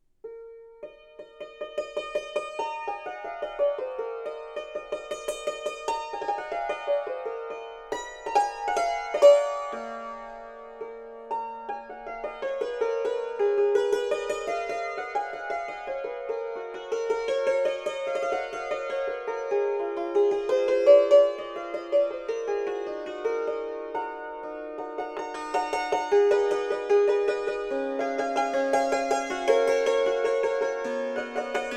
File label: battuto hammers with leather covering